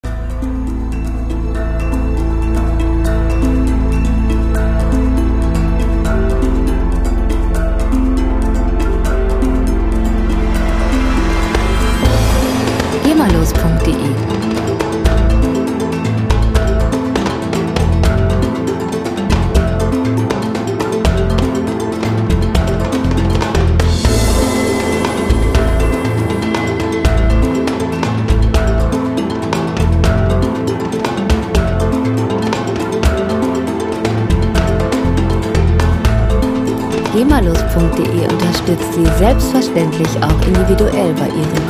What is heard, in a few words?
cymbalidas